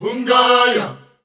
Hungaya D 120, Voices Of Africa Sung Phrases

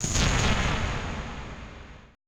AMBI FX

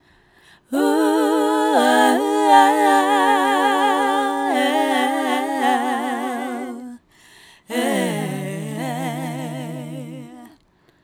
Female Vocal; Sonic Foundry (Sony Creative Software); Mac Money

